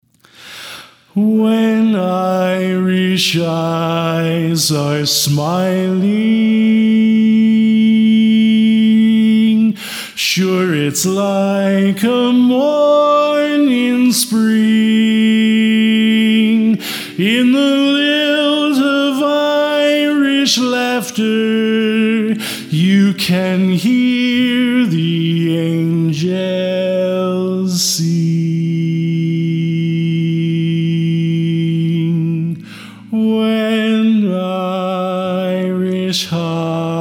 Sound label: Lead